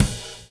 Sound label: GROOVE; AMG Gota Yashiki Groove Activator